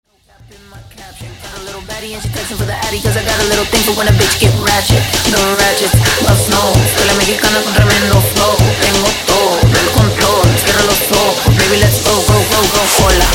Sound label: Big Room Mash; Mashup